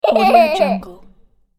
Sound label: child funny laugh